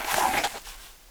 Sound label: draw six shooter; tf2classic